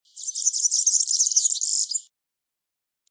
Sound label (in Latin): serinus